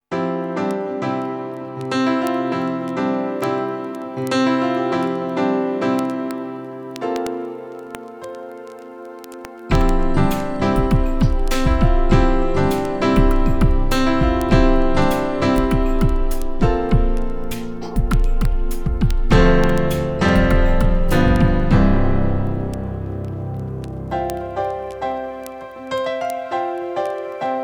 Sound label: piano & drums